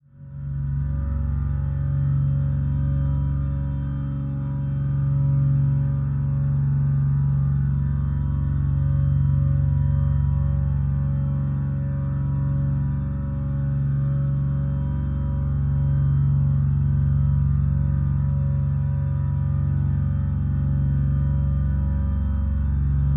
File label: Drone Horror Organ Steady Bed; Production Element Imaging; AdobeCC